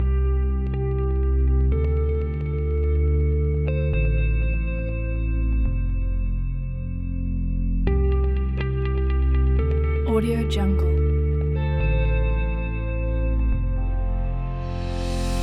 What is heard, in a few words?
motivational business